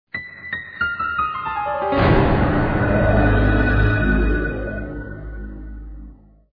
start

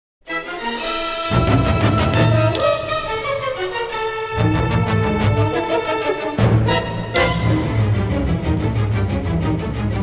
enemy Sudden Appear

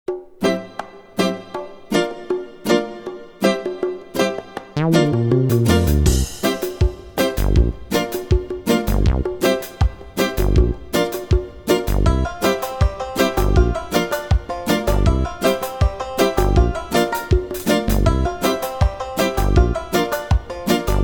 bgm

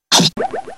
Attack

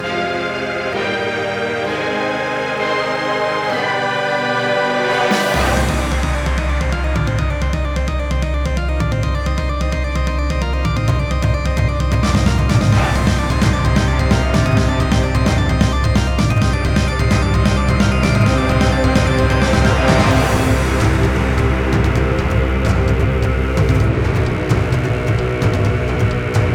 magic

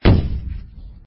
bomb

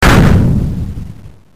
bomb

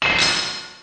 Ice

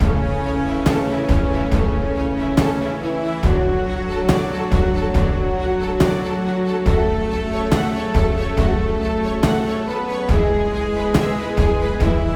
bgm

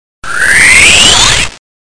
Charge Blood

